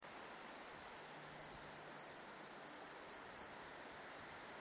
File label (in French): grimpereau des jardins